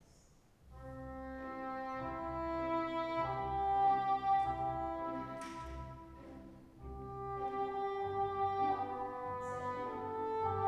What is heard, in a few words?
solo, oboe